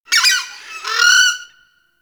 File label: Forest Creature Mid Medium; Warframe; Grineer